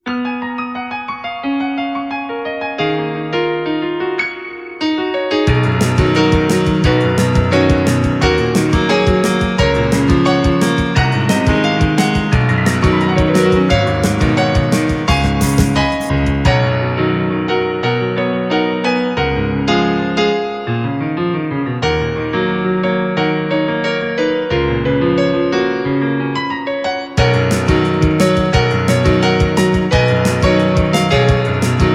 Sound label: song